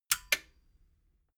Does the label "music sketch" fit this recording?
No